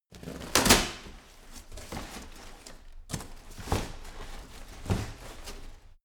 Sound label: recycle bin move